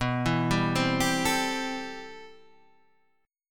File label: A-Minor 7th B; Am7/B arpeggiated; JGuitar